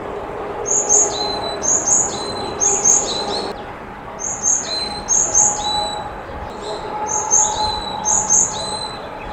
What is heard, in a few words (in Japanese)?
yamagara